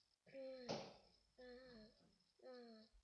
rain

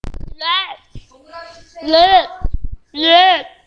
rat

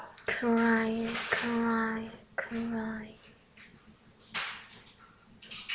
cry

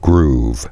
groove

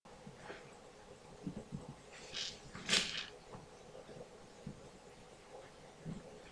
wheel

